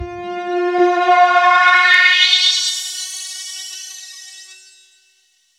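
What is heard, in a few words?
Filtered Guitar Rise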